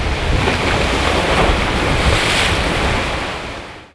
OCEAN SHUT; eCS 1.00; MMOS2

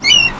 DROP, OCEAN; eCS 1.00; MMOS2

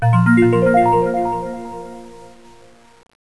KALIMBRA; Microsoft Office 95 Professional; VALUPACK; COMPADRE

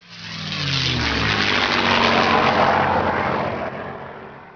AIRPLANE; Microsoft Office 95 Professional; VALUPACK

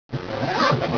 ZIPPER; OS2 Warp Connect; MMPM2

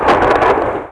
GARDEN DROP; eCS 1.00; MMOS2

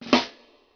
RIMSHOT; Microsoft Office 95 Professional; VALUPACK

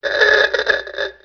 AHOOGAH; Microsoft Office 95 Professional; VALUPACK

